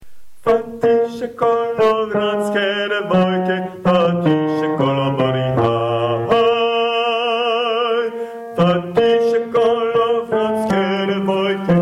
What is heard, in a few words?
Fatise kolo